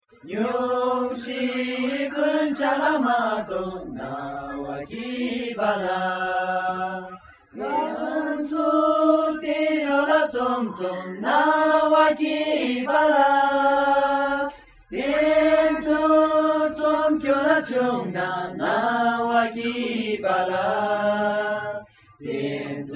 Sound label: Students Singing